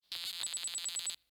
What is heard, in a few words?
burst of beeps buzzy squiggle; prosoundfx